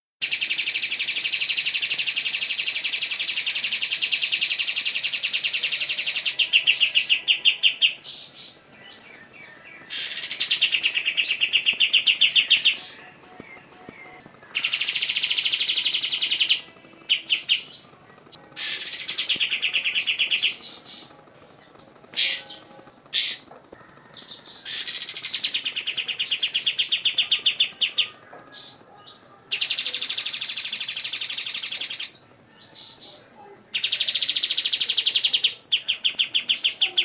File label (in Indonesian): kapas tembak